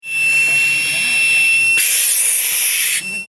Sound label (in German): Feuerwehruebung wenig Luft